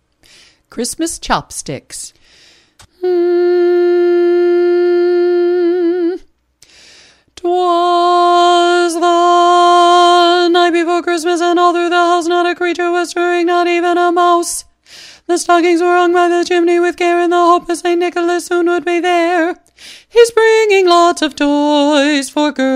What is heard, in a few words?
Chopsticks lead